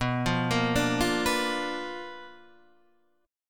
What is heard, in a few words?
B Minor Major 7th Flat 5th, Guitar, Open D